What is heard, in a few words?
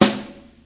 round sd, snare; single hits